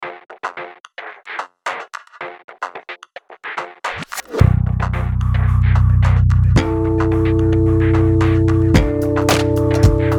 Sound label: Modern Bass; pettinhouse; kontakt 2